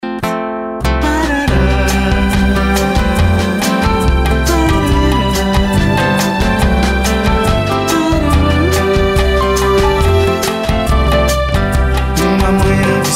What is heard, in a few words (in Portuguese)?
cafe com violão